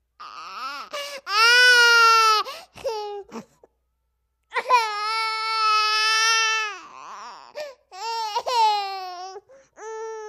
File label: Baby: 10-month-old, Crying. Interior.; Mono